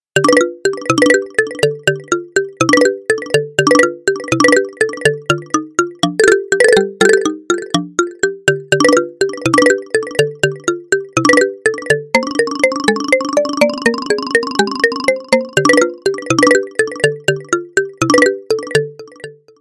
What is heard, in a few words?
ringtone